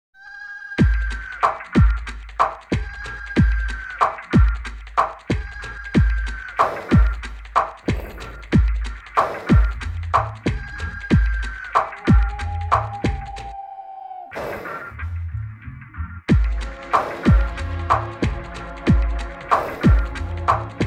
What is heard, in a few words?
Unhinged